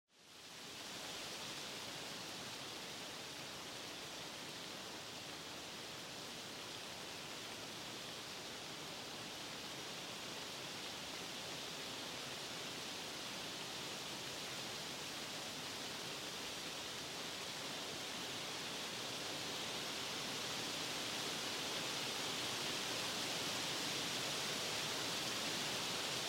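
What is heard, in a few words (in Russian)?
ветер в лесу с порывами; Звук ветра в лесу; звук ветра в листве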